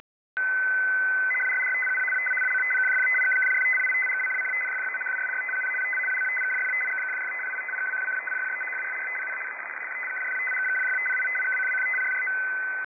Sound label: scan